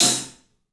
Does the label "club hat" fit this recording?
Yes